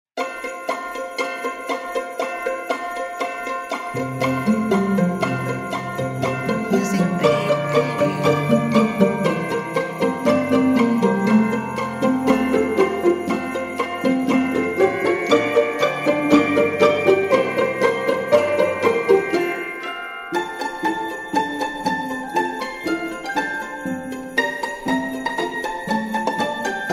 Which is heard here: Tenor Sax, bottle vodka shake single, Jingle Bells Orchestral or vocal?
Jingle Bells Orchestral